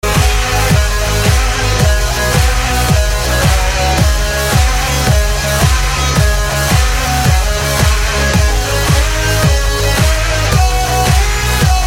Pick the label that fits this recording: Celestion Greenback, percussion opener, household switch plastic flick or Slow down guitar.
Slow down guitar